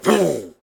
surfer approach

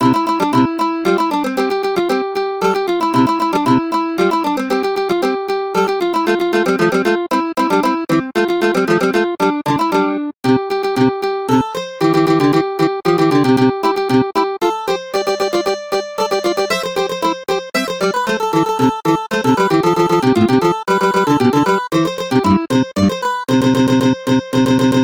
Mandolin; The Bard's Tale Remastered Trilogy

